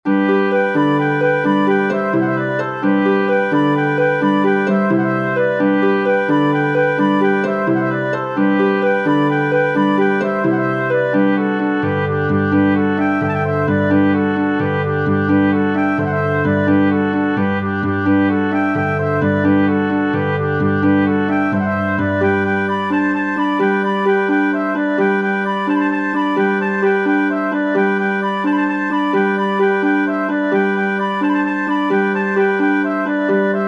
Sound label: march for flute oboe harp; anonymous macallistrum